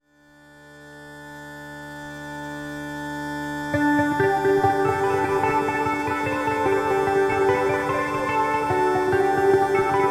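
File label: G major belmuse, irish mandolin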